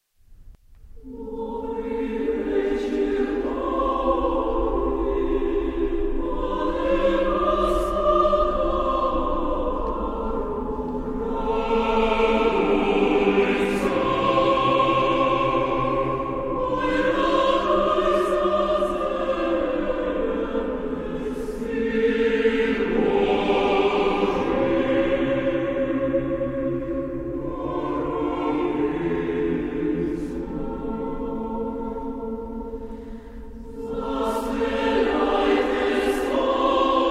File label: Four Russian Christmas carols (1 & 3 sung in Ukrai; Anonymous (Russian)